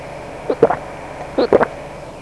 drinking water

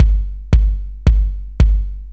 rave Gourd; SGI O2 Out of Box Experience; jungle Island; Cyber Gourds

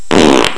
fart; whomp

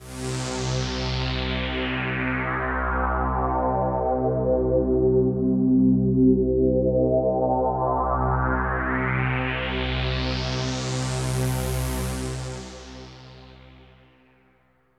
SYNTHPAD AMBNT 160 C; Ambient; Sphere

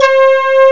brass flute

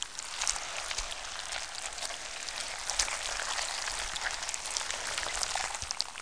snake; McDonaldland - Silly Games; McDonald's; treasure

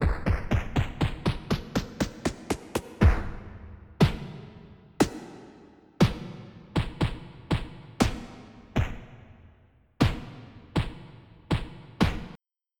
punch; Populous

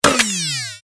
Bad Power Up Collect; bob espo

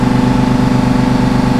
MOTOR, Slot Car

